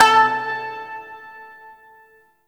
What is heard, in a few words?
Shawzin Oct Two A; Warframe